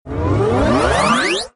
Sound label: gamble win; American Poker The Legends II